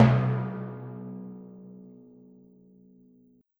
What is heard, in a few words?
Timpani, Orchestral Percussion One Shots